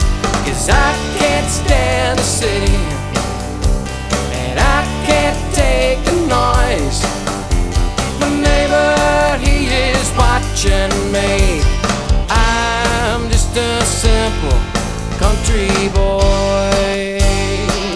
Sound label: country